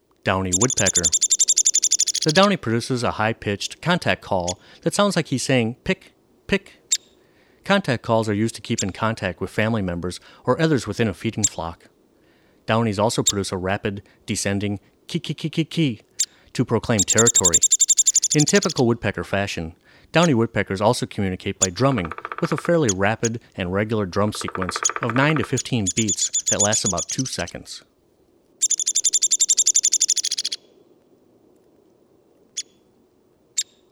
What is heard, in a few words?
Downy Woodpecker